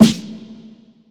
boomy snare one shot a key, Royality free snare single hit tuned to the A note